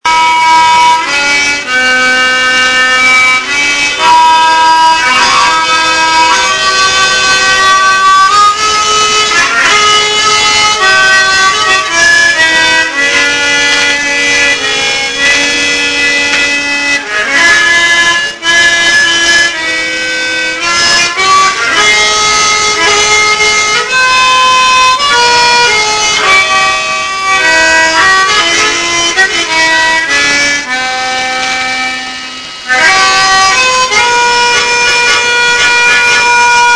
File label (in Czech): Harmoniky